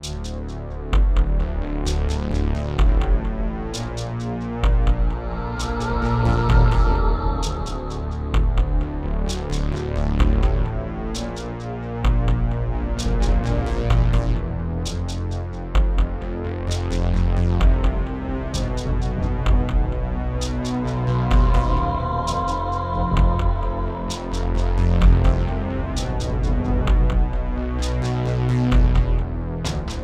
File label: Yamaha SHS-500; Descent II